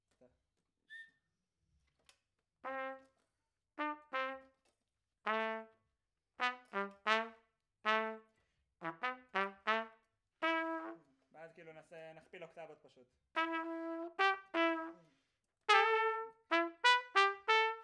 Trumpet Room

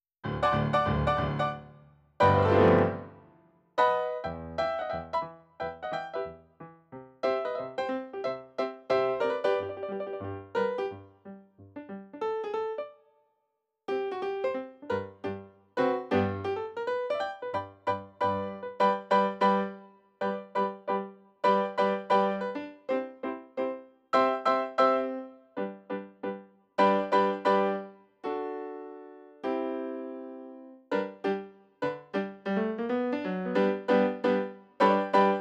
RnR Piano

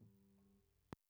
Bass DI

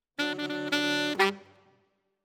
Alto, RNR for piano

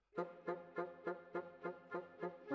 Clarinet

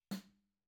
Tom

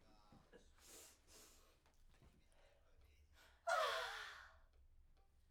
Backs SCHOEPS

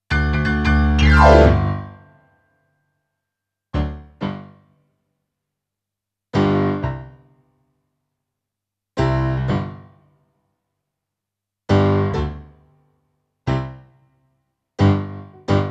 PIANO, RNR for piano

